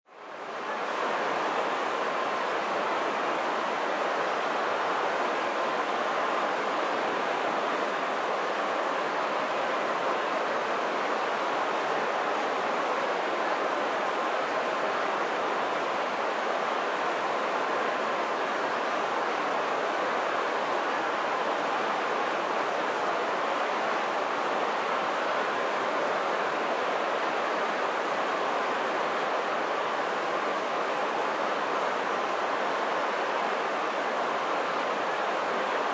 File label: Sports Crowd Indoor Stadium General Crowd Noise; Crowd Sound Effects